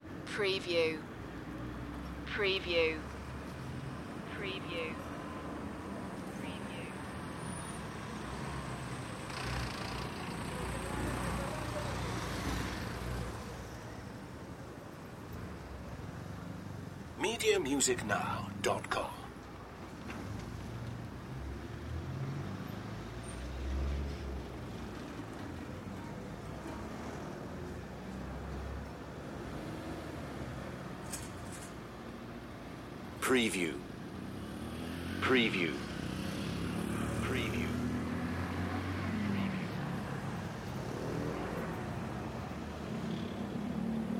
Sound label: city ambience bogota